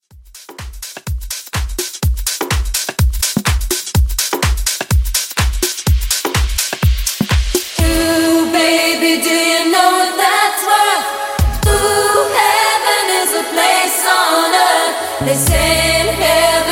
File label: 80s Club Classic 125 Bpm